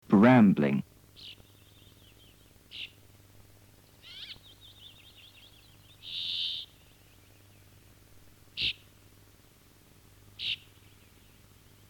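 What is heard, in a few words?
brambling voice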